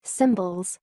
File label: cymbals